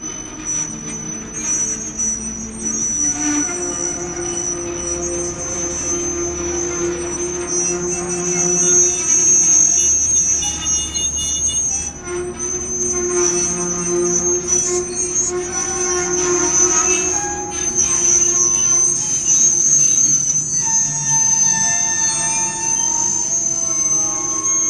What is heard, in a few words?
train stops; best service Blue Box